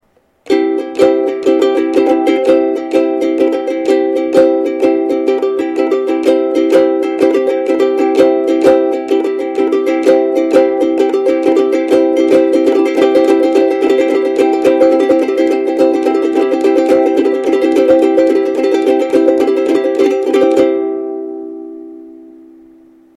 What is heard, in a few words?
uku